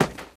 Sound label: Body Thud light; friday the 13th killer puzzle